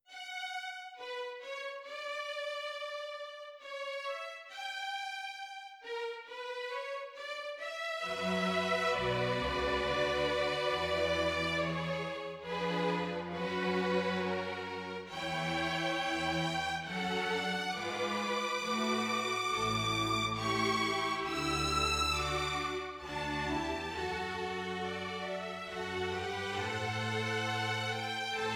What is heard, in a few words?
b minor str orch